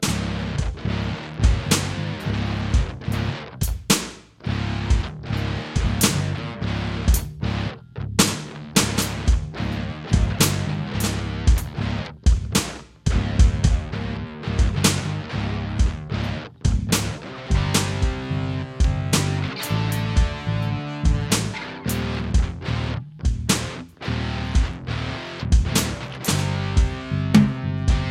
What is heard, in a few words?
hiphop